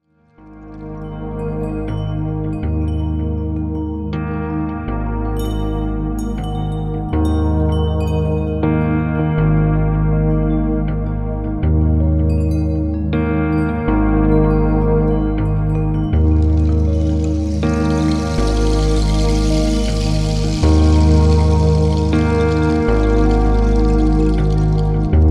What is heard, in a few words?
Relaxing